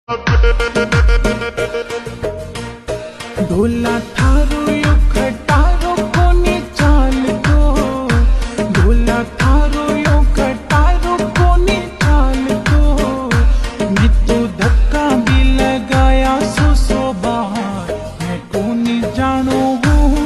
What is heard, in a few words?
Ringtone, slowed and reverb